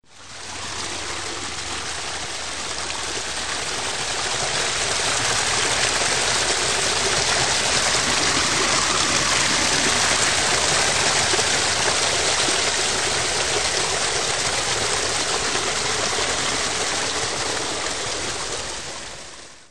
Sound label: woods creek